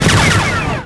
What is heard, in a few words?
n1 cannon, weapons; gmod; star wars vehicles episode 1